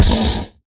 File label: hit, horse skeleton